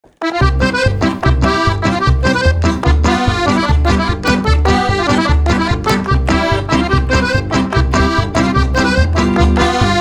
Polka

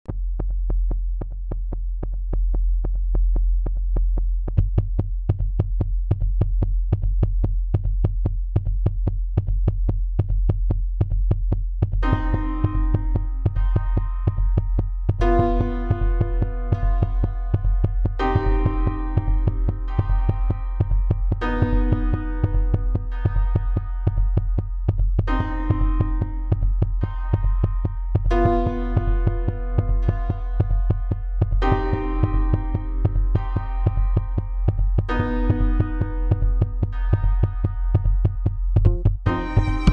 Instrumental; Trains

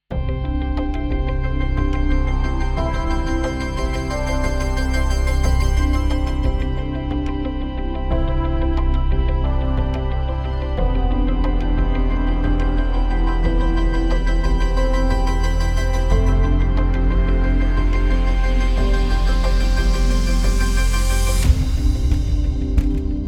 CINEMATIC

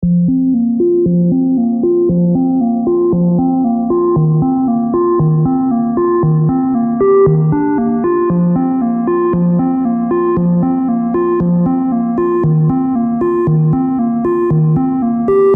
Sine Waves

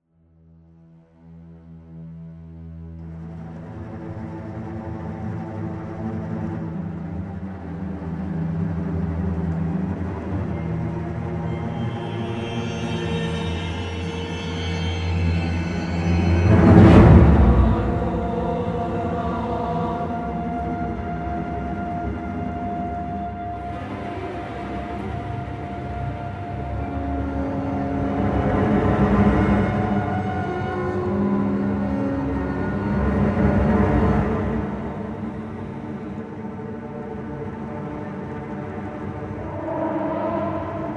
Scary Scene

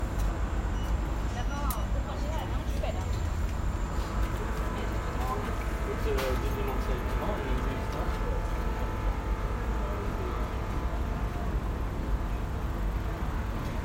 Osterhaus gate, Oslo

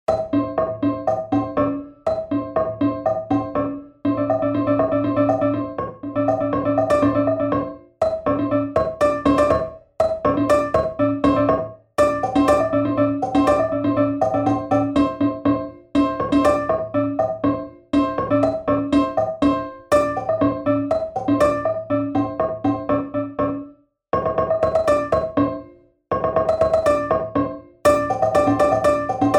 five keys

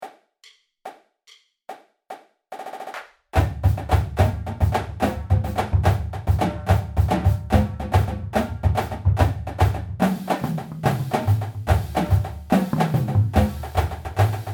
Flam Placements

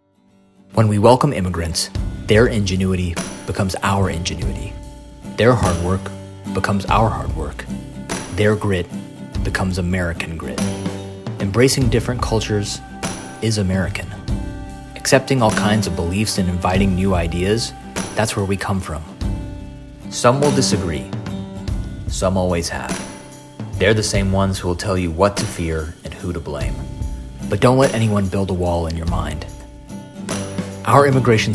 BLUES ROCK

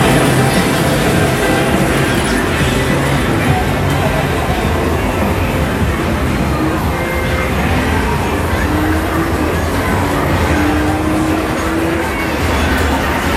Game Arcade, Shinjuku, Tokyo

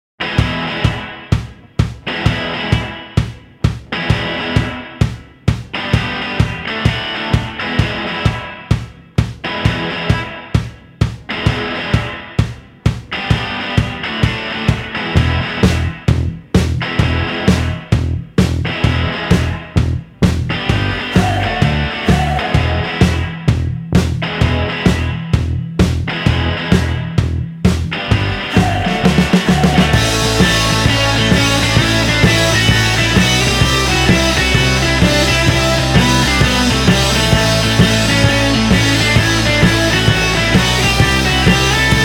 Rock March

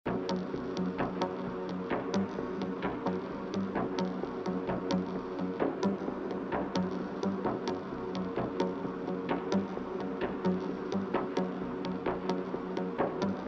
BEAT

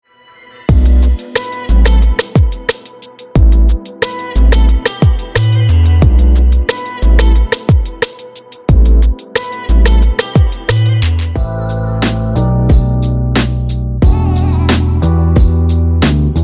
Urban Pop

